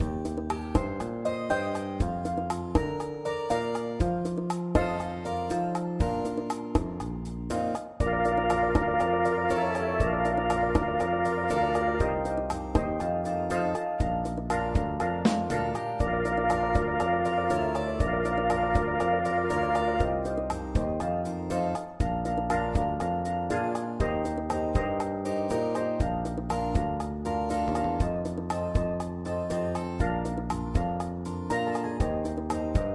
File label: Oboe